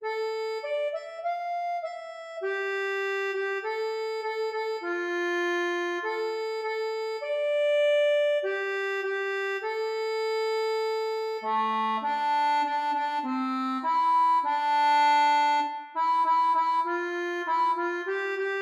ACCORDEON

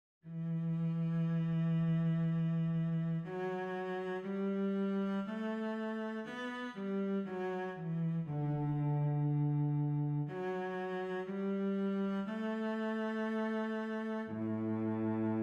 VIOLONCELLE

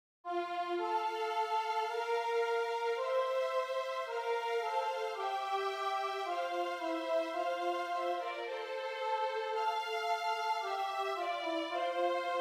Psaume VIOLON

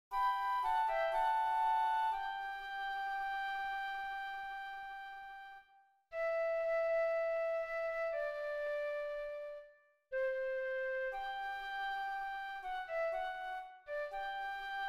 FLUTE

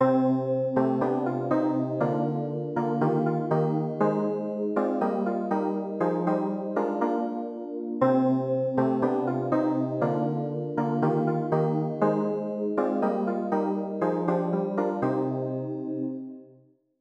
CLAVIER; ALLELUIA colombiere

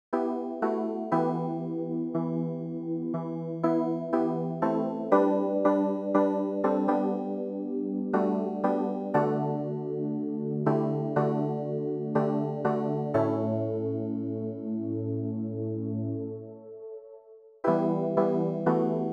CLAVIERS

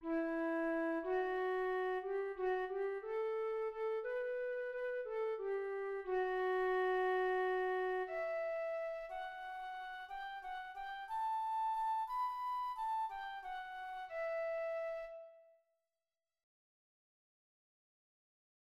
FLUTE

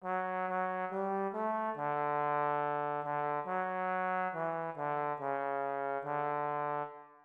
psaume trombone

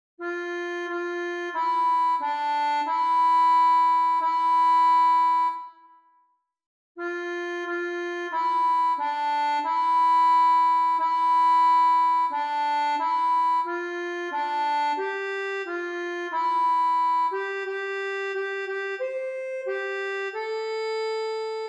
AGNUS ACCORDEON; St Jean

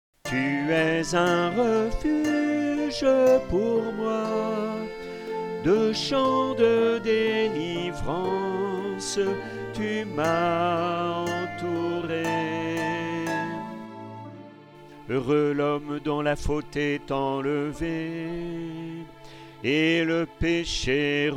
Psaume CHANT

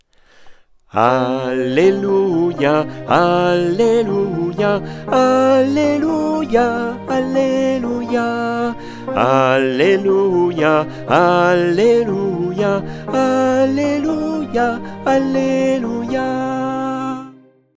SOPRANE; ALLELUIA colombiere

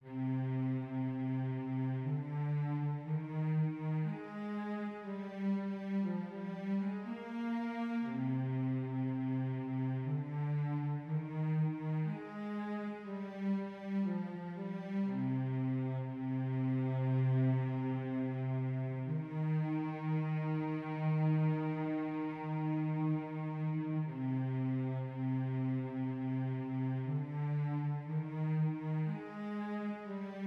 VIOLONCELLE; ALLELUIA colombiere

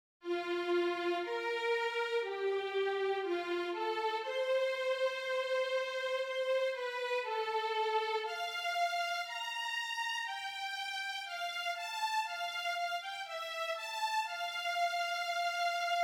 VIOLON; SANCTUS St Jean

